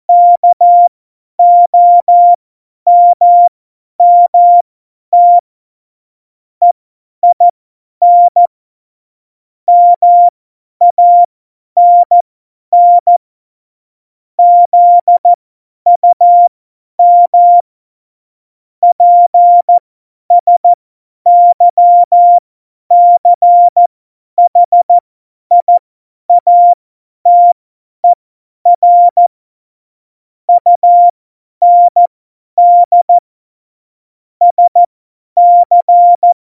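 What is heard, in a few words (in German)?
Witz, cw morsen; Morsekurs; Witze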